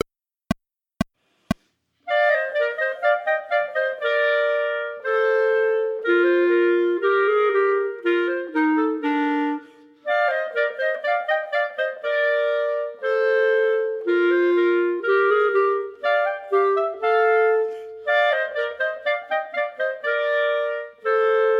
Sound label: March, minus 3rd part, Clarinets; Partita in F; Chamber Music for 3 Clarinets Vol I